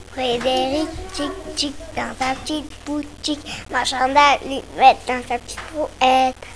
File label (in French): chant